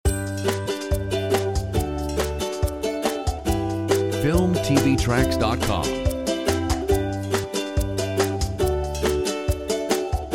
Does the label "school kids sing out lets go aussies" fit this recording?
No